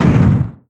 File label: ship Explosion; incredible hulk chitauri takedown